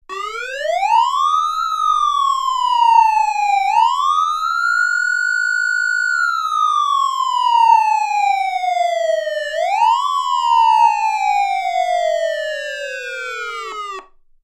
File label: dual manual wail, siren; sa441M